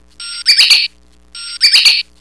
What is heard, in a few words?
bird